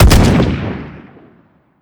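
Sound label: weapon fire player; weapons; gmod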